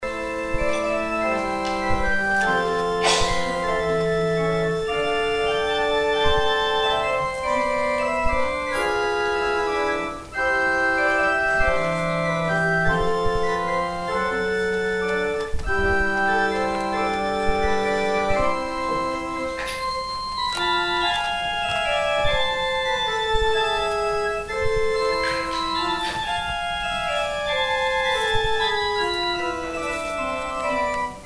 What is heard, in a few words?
organ interlude